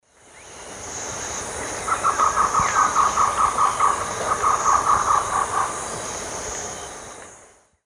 Trogon melanurus, calls female; S. America; Eastern Lowlands

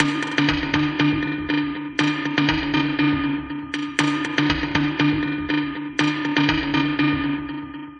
Clay Drum Beat; Electronica; Solo Beats

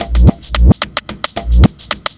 block rock kit

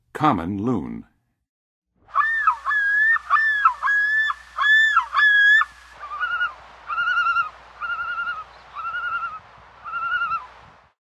Common Loon; North America; Western USA; Western USA Songbirds

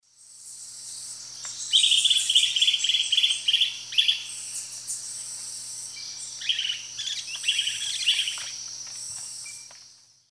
Conopias parvus, calls; S. America; Eastern Lowlands

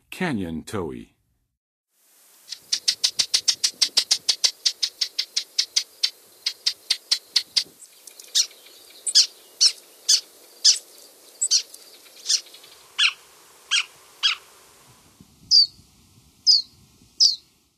Canyon Towhee, Songs of Birds of North America; Western USA; Western USA Songbirds

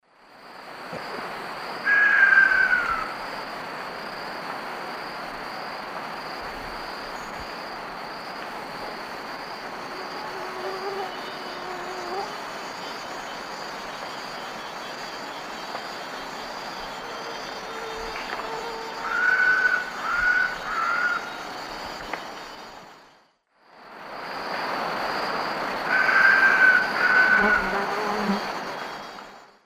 Piculus chrysochloros, calls; S. America; Eastern Lowlands

